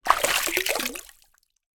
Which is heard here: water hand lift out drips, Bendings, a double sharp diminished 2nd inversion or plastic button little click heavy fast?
water hand lift out drips